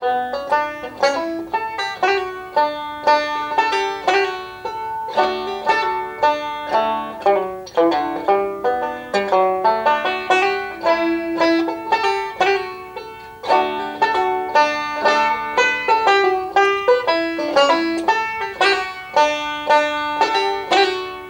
Reel, musicbox

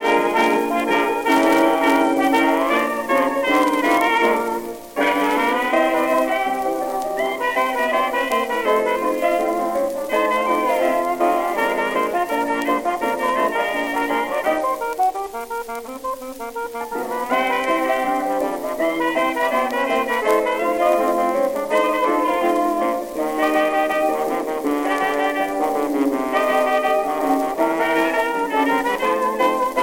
jukebox blues

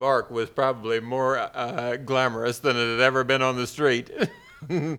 organ

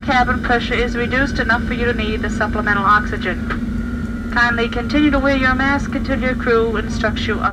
Airplane Jet trip

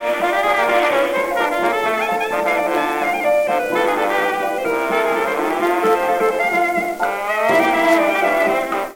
jukebox

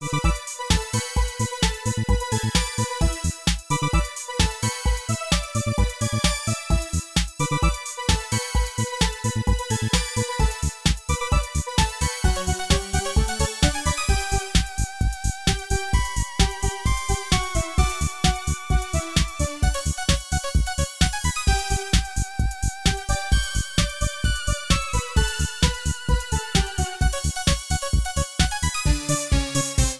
Disco

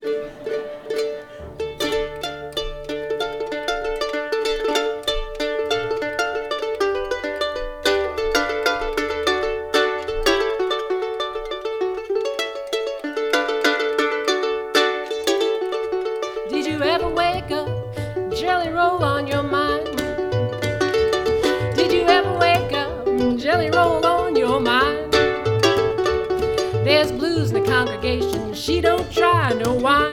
Rocking Chair Blues, musicbox

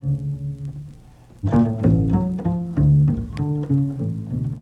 Music unusual sounds

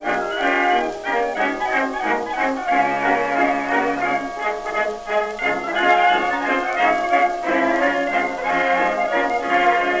Chinese blues, jukebox blues

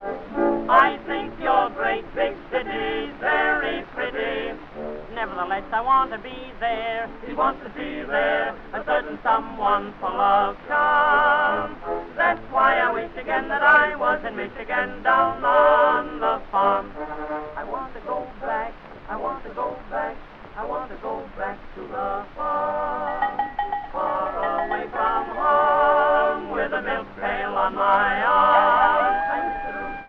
tenor and chorus orchestra accompaniment; NY Hippodrome

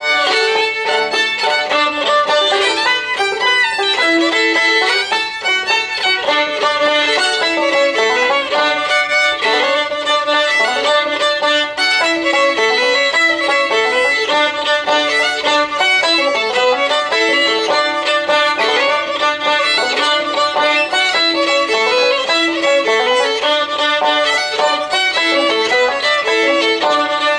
Serenade

